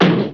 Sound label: HIT CHAR; Sonic R; Windows